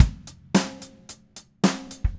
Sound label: Red Maple Groove 110, DW Red Maple Kit; 110 BPM; Sonic Foundry (Sony Creative Software); Vital Drums